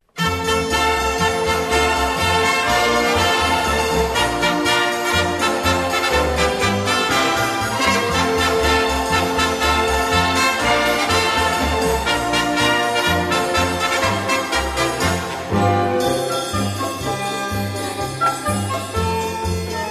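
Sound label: JINGLE BELLS ORCHESTRA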